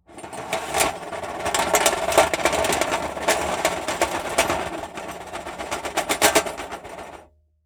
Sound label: meetal plate rattle